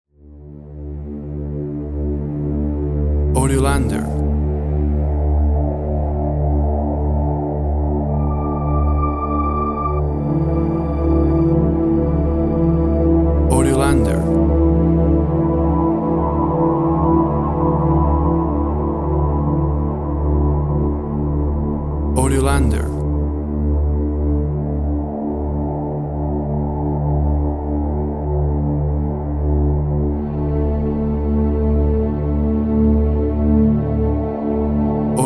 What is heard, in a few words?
Thriller Soundscape Texture